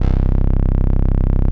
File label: 505, String Machines